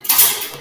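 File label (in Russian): разрушение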